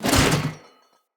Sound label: Corrupted Battle Dog Foley Land